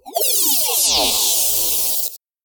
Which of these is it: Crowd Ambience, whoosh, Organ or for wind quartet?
whoosh